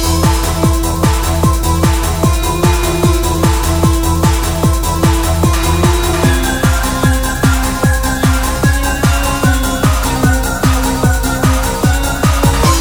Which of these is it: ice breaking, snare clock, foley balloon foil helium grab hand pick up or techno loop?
techno loop